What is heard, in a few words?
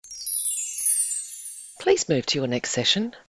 magic chimes please move to your next session